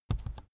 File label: keyboard laptop enter press slow soft; kommunikation; computer